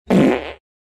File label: fart